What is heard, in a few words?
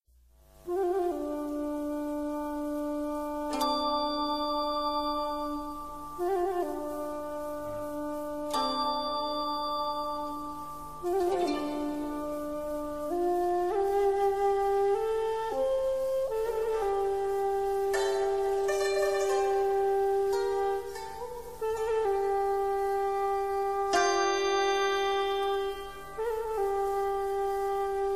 chinese music